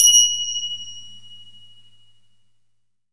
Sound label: FINGER CYM